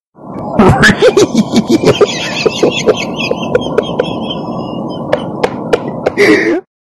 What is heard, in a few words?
laughing; scooby-doo